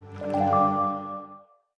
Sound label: chat start new; Nokia; 770 Internet Tablet